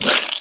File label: squirt